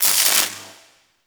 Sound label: Elec Spark; Ambience; Props; Warframe; Nokko Colony